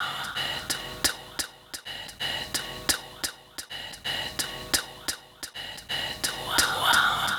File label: Fx Vox Loop 130; Equipped Music; Loungin House